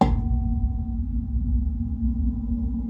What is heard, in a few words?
reamp plink; radio 101